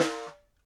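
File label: snare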